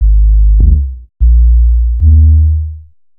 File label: Bass, Futurist Drum & Bass